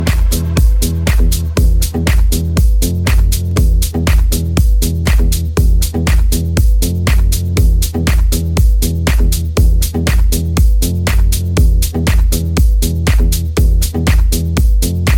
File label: bass beat ringtone